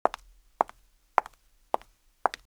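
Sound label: footstep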